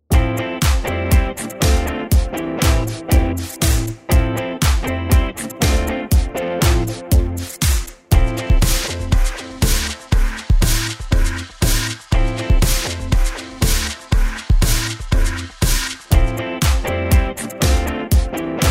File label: Guit Pop 120